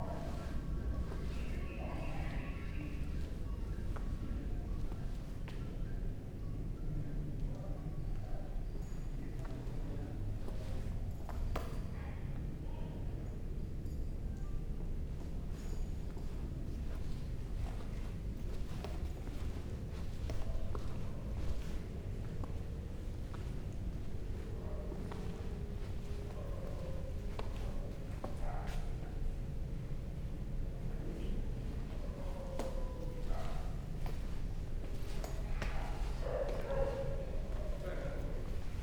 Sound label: AMBIENCE Inside Museum, Walking POV, Bali, Indonesia, LOOP; Articulated Sounds; Bali Ubud Village Ambiences